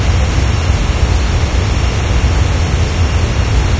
amb sterile lp, industrial; video games; ambient

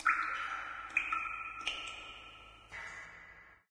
ambient water drips; video games; nature; source engine; portal2

